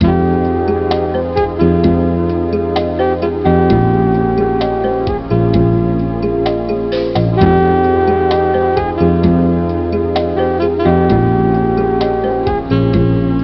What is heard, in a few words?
Waiting